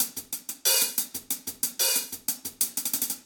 hihat

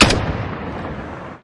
Rifle; MilkChoco

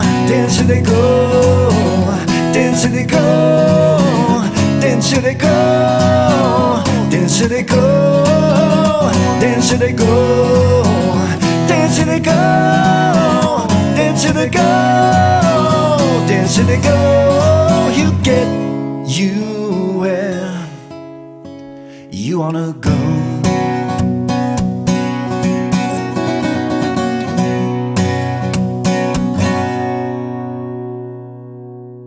Song Short

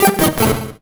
Solo Chip Arp Negative; MUSIC EFFECT; startide

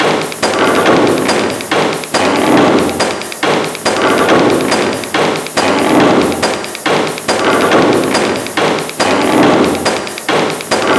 reveal music, construction; Birthday Party Bash; Wii

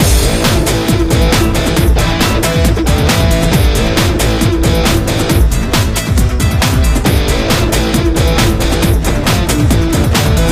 Breaks; Final Drive Fury; windows gamerip 2006

